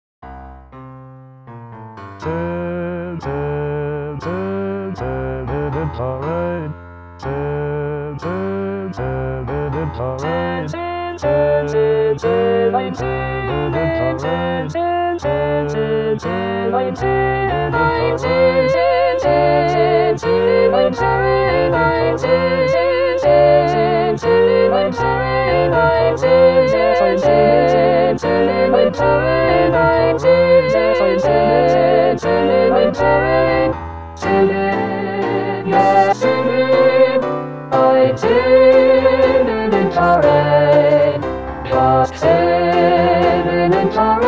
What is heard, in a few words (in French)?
satb chante accomp